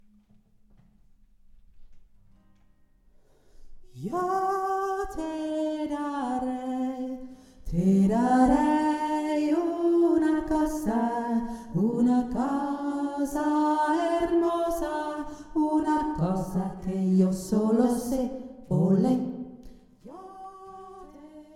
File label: yo te dare tiefere stimme; yo te darei tiefere Stimme